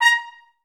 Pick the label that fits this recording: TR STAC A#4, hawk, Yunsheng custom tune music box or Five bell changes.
TR STAC A#4